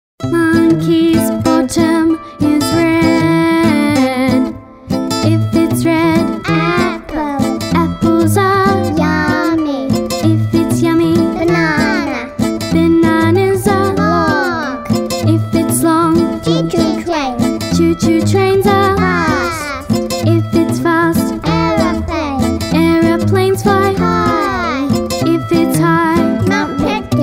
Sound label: Monkey's Bottom (English)